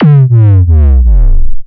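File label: Kick Slowdown Distortion Gate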